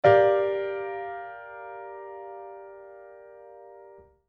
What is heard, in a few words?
Aural Tests Recog of chords; Grade 4; pop vocals